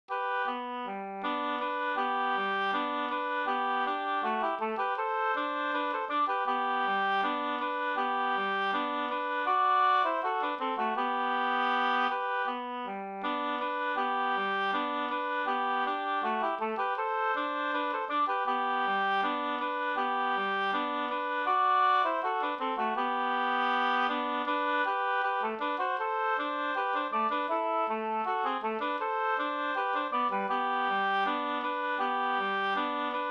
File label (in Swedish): skanklat; Ljuslat; Anders Andersson Lastringe